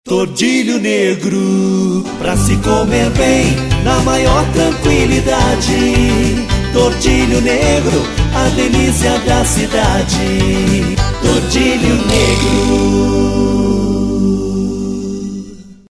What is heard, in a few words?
JINGLE; TORDILHO NEGRO